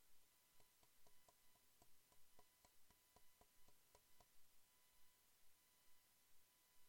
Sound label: blue yeti defective hum